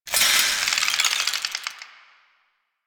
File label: Mace Spindown; Warframe; Duviri; Duviri Dax; Weapons; Duviri Mace And Shield